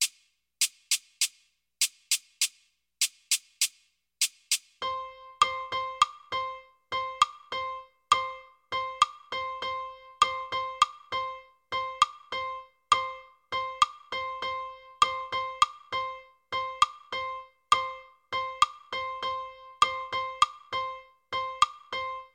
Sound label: clave 2-3 tumbao slow